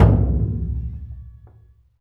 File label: Burundi dry, Heart of Africa; Spectrasonics